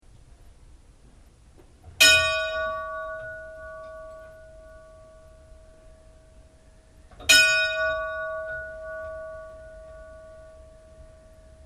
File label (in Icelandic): hvalsneskirkja litla 3, kirkjuklukkur